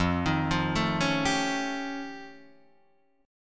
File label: F Augmented 9th F Guitar Open D, chord